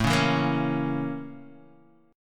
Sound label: Bb Minor Major 7th Flat 5th